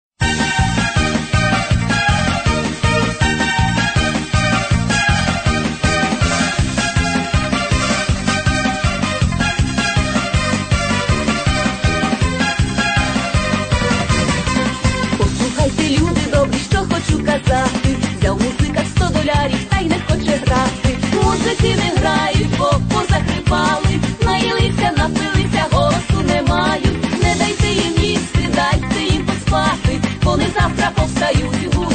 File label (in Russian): ukrainskaya shutochnaya svadebnaya